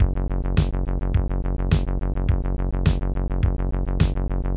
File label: bass arp